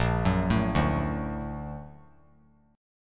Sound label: A sharp Augmented Major 7th; A#+M7 arpeggiated; JGuitar